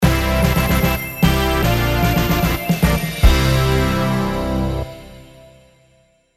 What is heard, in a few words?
jingle